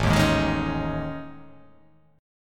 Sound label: A Major 7th Flat 5th, down